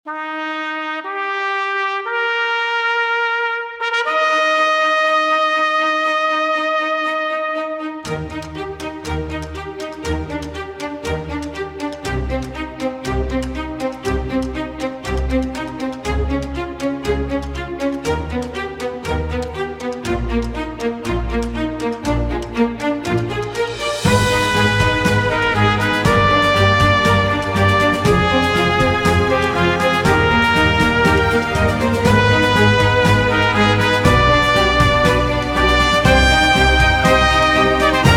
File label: Trumpets